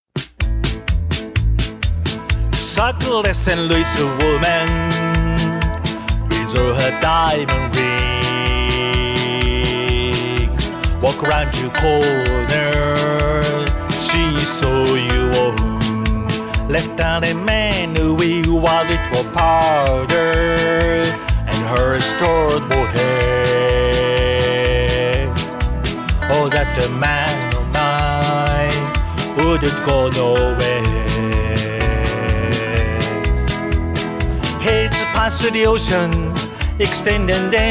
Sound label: vocal